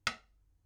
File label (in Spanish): Baqueta ancho, beats with different tools and materials